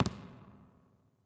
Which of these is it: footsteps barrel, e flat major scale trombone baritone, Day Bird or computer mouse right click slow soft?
footsteps barrel